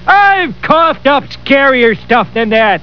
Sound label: cough up